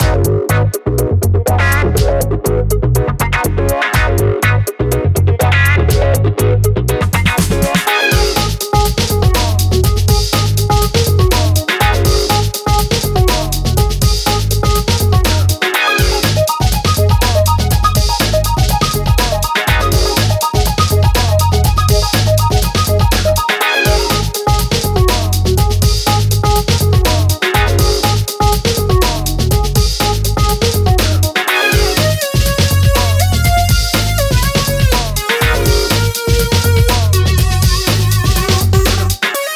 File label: Funky Park Loop